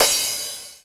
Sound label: cymbal, Roland MC-909